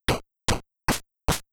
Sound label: Noise Kick Cymbals, chiptune